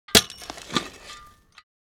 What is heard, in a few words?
Metal shovel dirt impact